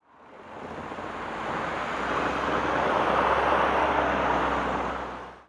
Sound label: office outside drive by; cstrike